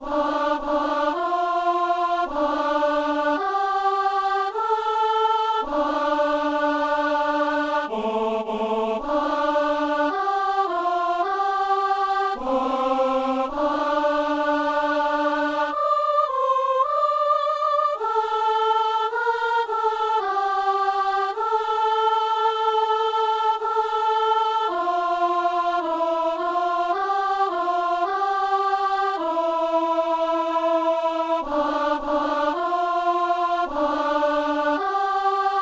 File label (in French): messe simple kyrie soliste et alto